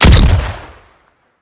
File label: pistol fire large, weapons